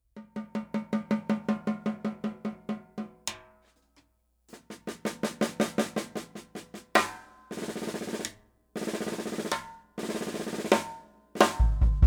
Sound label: Taye Studio Maple bop drum set